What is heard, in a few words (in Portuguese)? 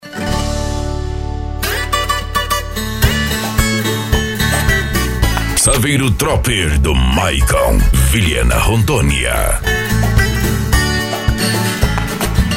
pagode de viola